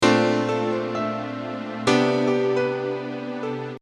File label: piano strings 130